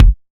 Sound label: good bass drum sound E key; kick drum samples